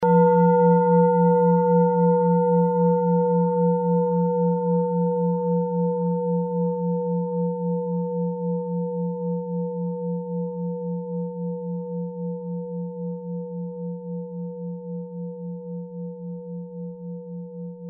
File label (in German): assam planetentonschale